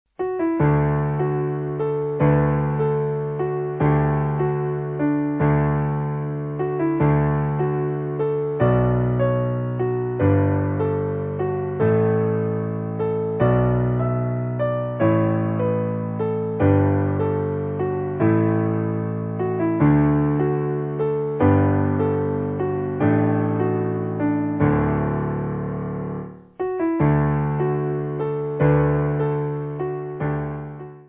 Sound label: Midi